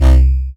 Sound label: BASS Vowelie, bass; M8; Electronisounds